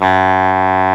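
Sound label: WIND A#1; Roland LA; ORCHESTRA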